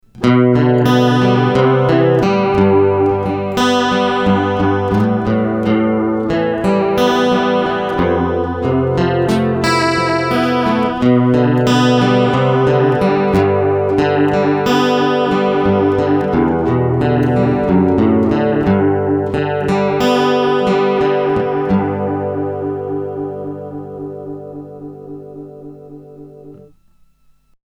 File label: Chorus Depth Full Speed